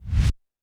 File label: Roland TD-20 Percussion